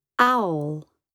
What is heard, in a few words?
owl